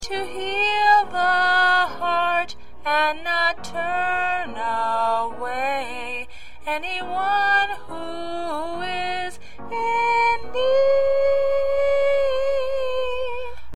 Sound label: Lead Alto